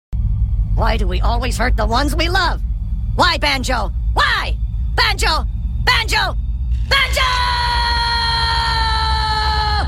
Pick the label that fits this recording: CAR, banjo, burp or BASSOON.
banjo